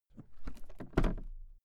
briefcase open; industrie wirtschaft; bueroarbeit

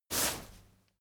crowd movement single bright; bewegung; soundpool

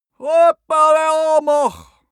male shout arabian

